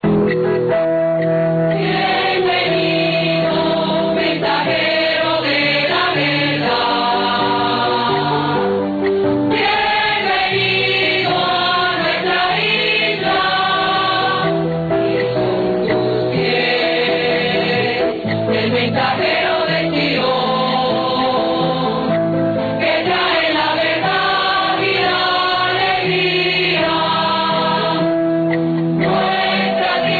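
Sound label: Choir Rehearsal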